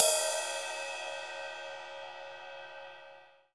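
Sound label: Cymbal Ride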